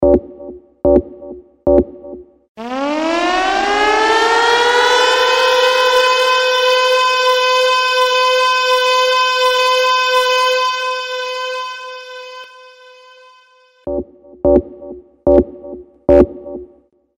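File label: alarm tone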